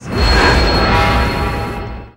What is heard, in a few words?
Flashback